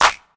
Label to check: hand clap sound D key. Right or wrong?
Right